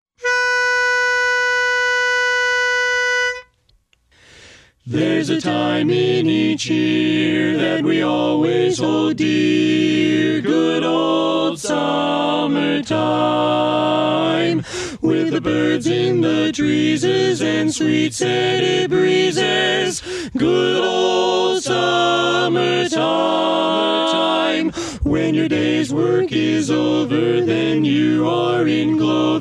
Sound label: Tenor